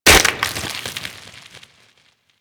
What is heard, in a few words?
Infested Dart Pistol Mech; Warframe; Weapons